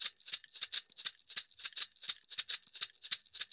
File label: Cabasa, Festa Latina, Drums & Percussion; Best Service; Festa Latina VOL-1